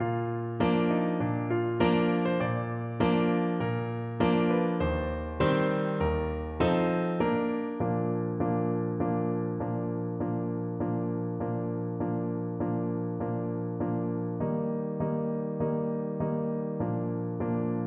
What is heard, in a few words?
battle hymn, Tuba